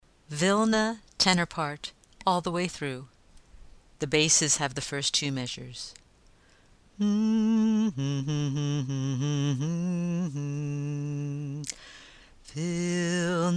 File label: tenor verses and refrain